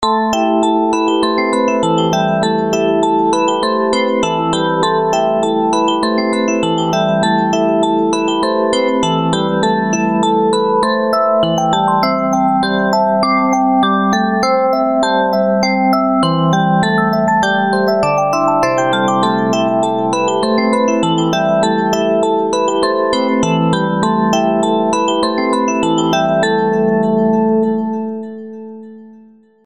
Music box Remix

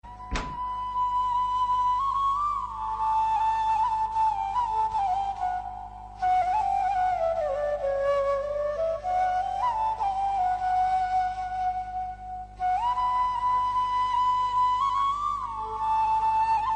Soft Romantic Flute

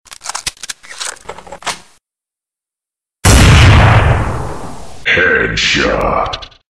Sniper Headshot

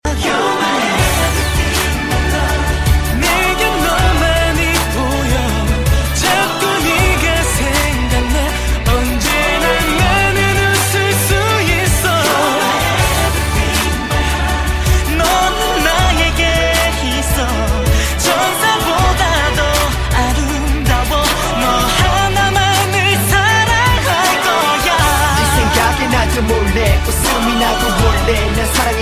Ballad

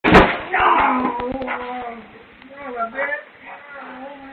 Grandpa Falls

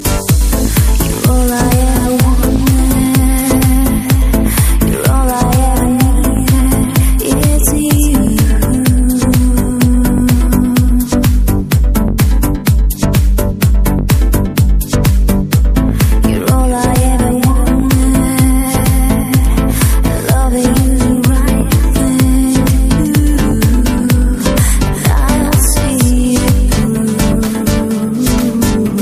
Vocal House; meloboom

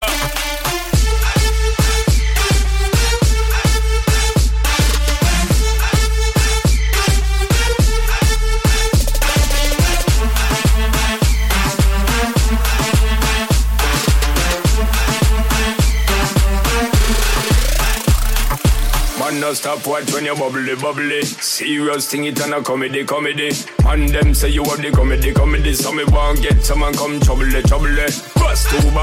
Trumpets

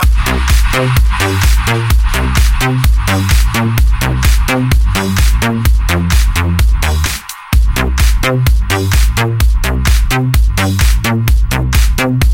Best Techno Ibiza

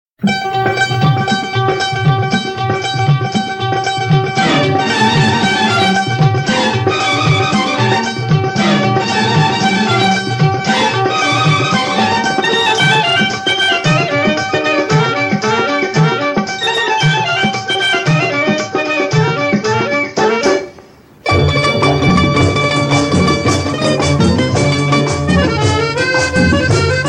Old Music

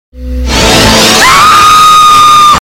Victory bell

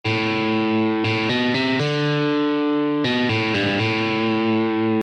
Rock Riff Guitar SMS

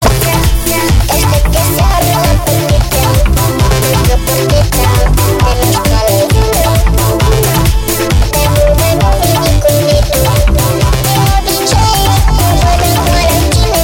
Remix

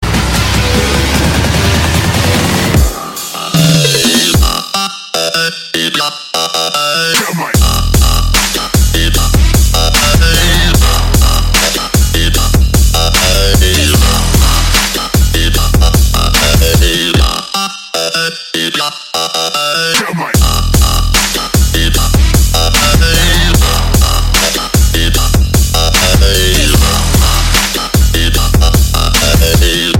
Dubstep Ringtone

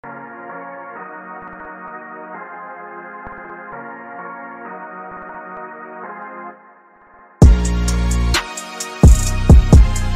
litty beat

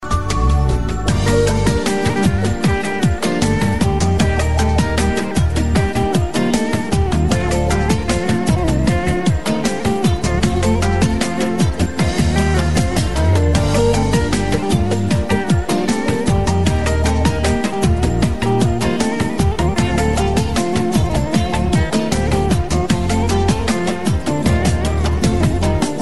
Azeri

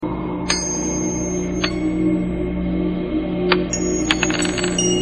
Pin Drop Sms

